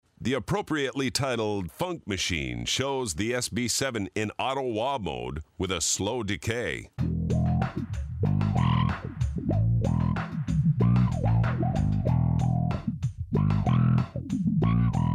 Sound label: Funk Machine